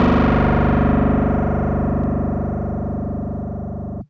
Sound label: Fuzz Clav DX100 G0